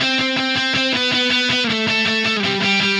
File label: Happy Pub Guitar